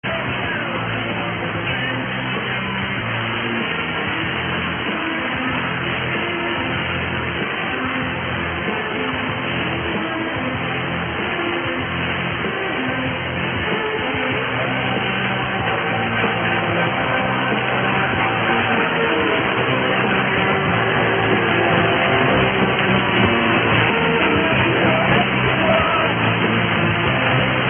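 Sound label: Absolute UK, radio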